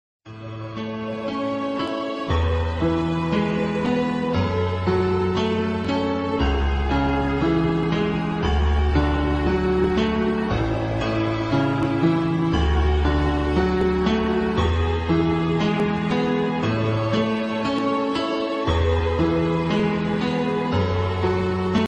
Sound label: christmas ringtone